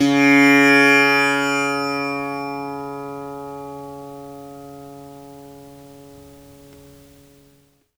Indian Percussion Tabla; Mango Loops

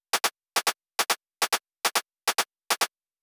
drum Loop 140BPM HH; Vengeance - Essential Clubsounds Vol.5; VEC5 Drumloops; VEC5 Multi Loops 140BPM

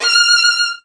Virtuoso Strings F3; ILIO; Kirk Hunter Virtuoso Strings VOL-1

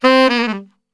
C 2 SAX; Best Service; Brass Super Section

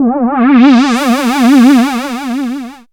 syn c, Dance Synths, Juno; Juno Jam

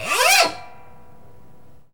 WIND SOUN

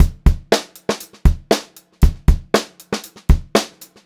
POP BEAT, Pop-Rock Drumloops; 118 POP

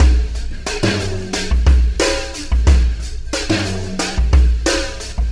Alien Influence Beat, Processed Drumkits; Sonic Foundry (Sony Creative Software) - Processed Drumkits Zero Gravity Beats

